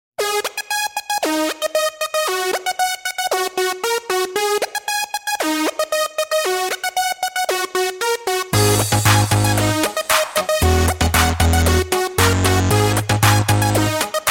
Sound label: funky wave; jazz